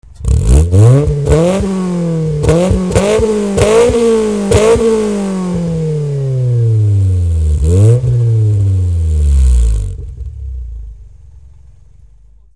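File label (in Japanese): HKS hiper, 92LEVIN+HKS Hiper レーシング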